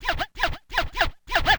scratch, DJ Booth; effects

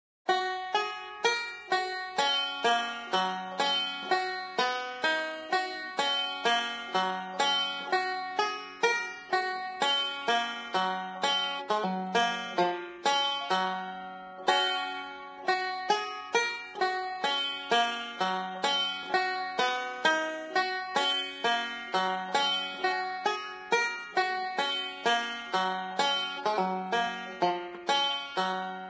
Banjo; Zomboid; Lifestyle

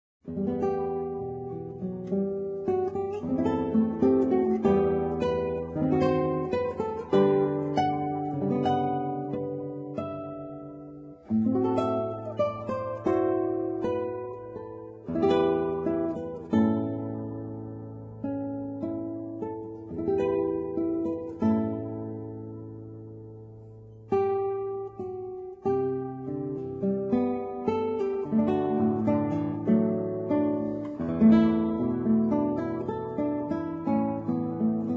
Guitar Acoustic; Zomboid; Lifestyle

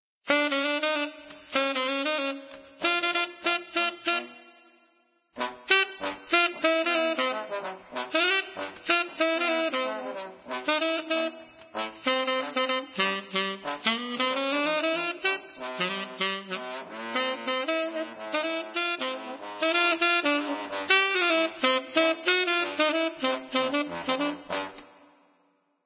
Saxophone Duet; When The Saints Go Marching In

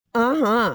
Woman Dance Happy; voice; Zomboid; Lifestyle

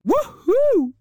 Woman Woohoo; Zomboid; Lifestyle

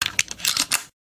lever load; Zomboid; VFE

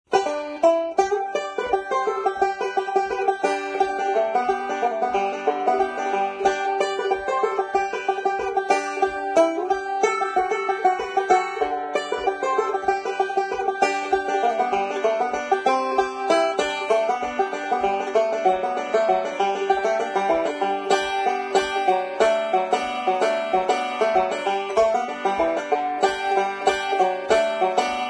Banjo; Zomboid; Lifestyle

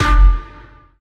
Keytar Failstate; Instruments

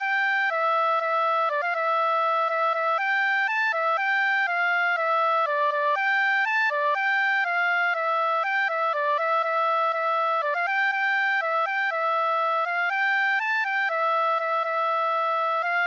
flute; Zomboid; EntertainYourself

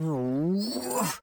Man Frustrated; voice; Zomboid; Lifestyle

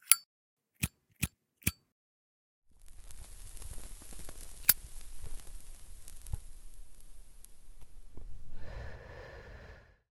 smoking lighter; Zomboid; Smoking Sound Overhaul

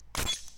beartrap; Zomboid; Player Traps

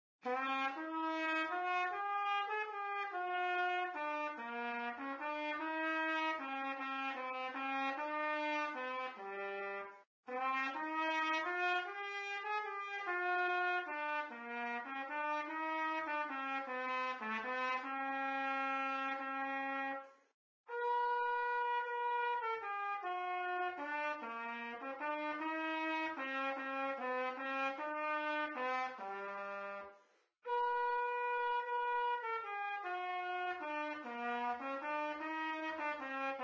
Trumpet; Zomboid; Lifestyle

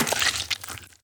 zombie death; Zomboid; More Zombie Death Sounds

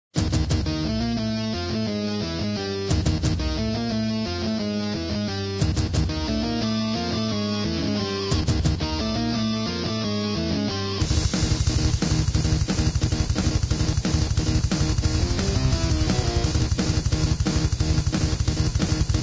Keytar

